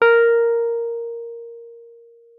WURLITZER, A#4; Xsample VOL-1; 90 s Sample CDs; WURLITZER RS

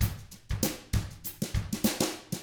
FUNKY, Drums

